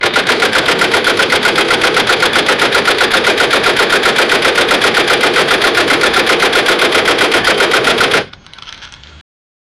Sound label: dardanelles gun sound, cannon